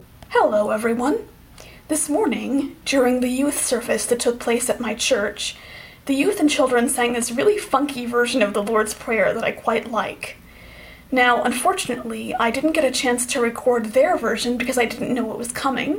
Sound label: a funky version of the Lord's Prayer sung